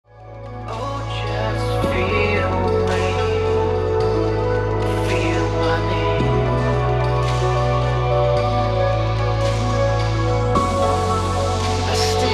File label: ambient chill